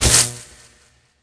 bite; smite; serqet

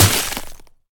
Impact Ice; smite; god merlin

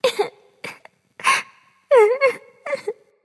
boohoo; smite; voicepack; scylla

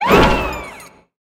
Swing; Smite; god Kumbhakarna

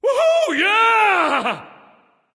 yay; smite; thanatos

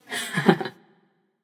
heh heh passion; chaac

